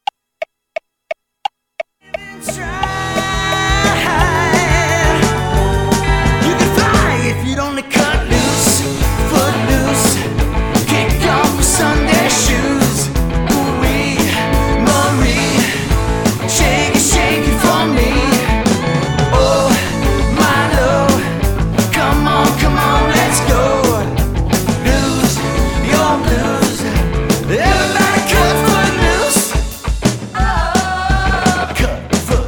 80s Band

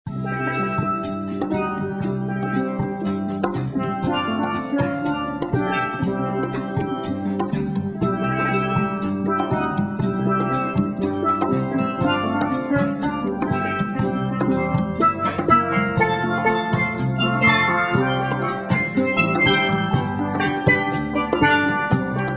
Steel Drum Band

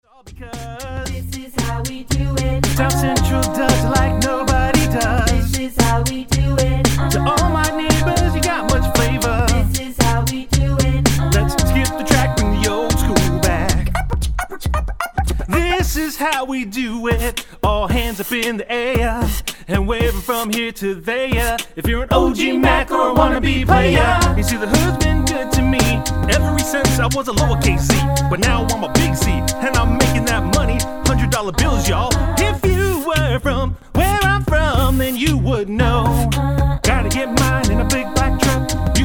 Acappella Group; Washington DC

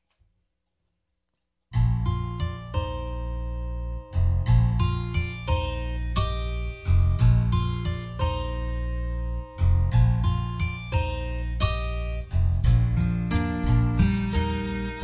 Tribute Band

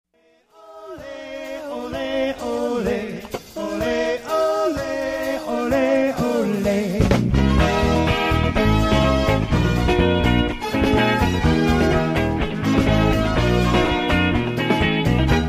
Party Band; Miami Party Band

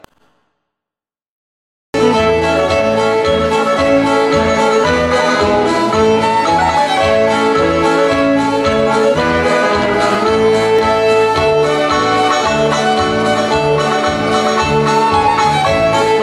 German Band Polka; Seattle

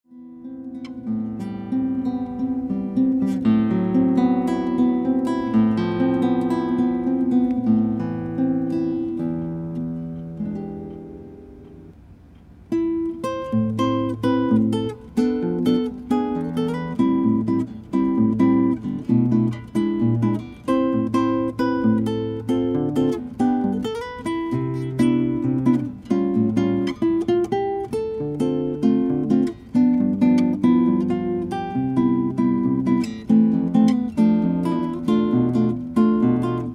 Brazilian Guitarist

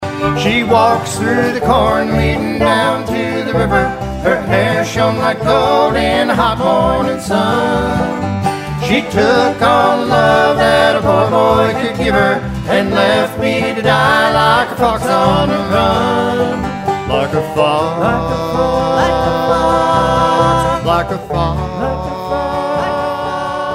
Country Band; Hartford

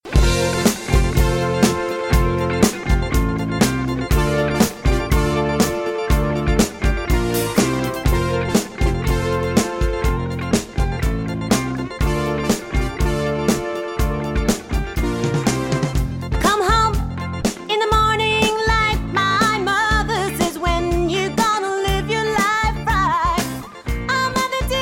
80s Band

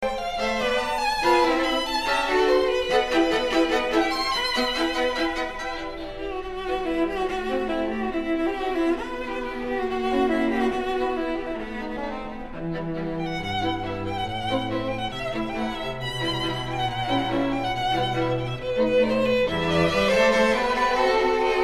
String Quartet

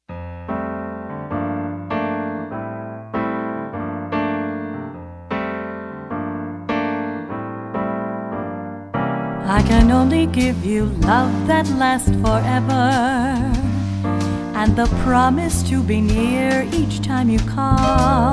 Jazz Singer, Quartet; Rochester

